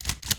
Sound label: Reload, Firearms; HeroEditor4D